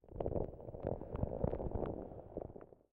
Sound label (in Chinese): 下界荒地地面声; ambient